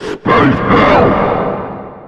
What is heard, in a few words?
appear; satan